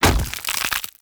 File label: Acorn Impact Dirt; Enemy; Characters; Abilities; Mendicant; Mnd Wazard